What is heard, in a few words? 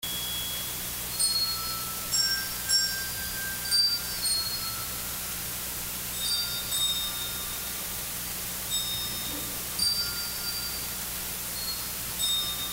Bell Youth Choir